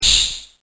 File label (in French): vapeur